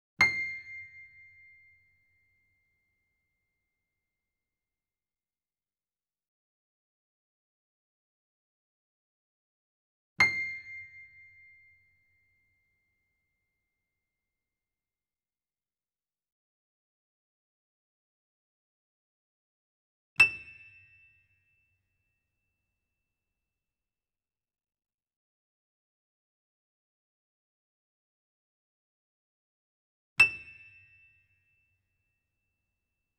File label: C7 E7 A7 Grand Piano & Dig Piano; Sound Sampling Collection VOL-3; Grand Piano 88